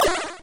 eat Liz; Dino Run

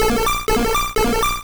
Bubblebeam; sfx; attack moves; pokemon; gen 1; rby

